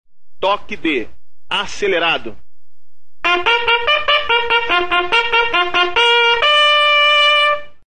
Acelerado, Toques de Corneta

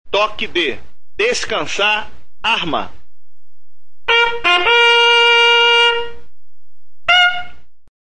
Descansar Arma, toques de corneta

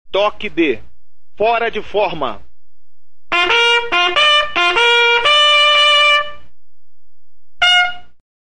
Fora de Forma, toques de corneta

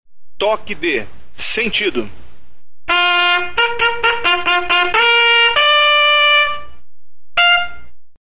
Sentido, toques de corneta

